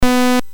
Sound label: MOS6581R3, GAMES; Who Killed Cock Robi BASIC; HVSC